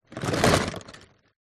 office felt tip pens pencils other stationery tip side plastic tub